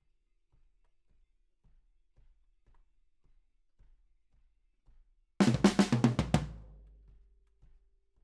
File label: Ride